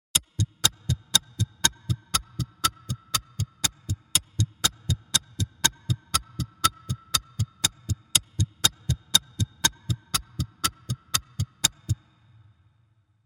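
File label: alarm clock in reverse; Звуки обратного отсчета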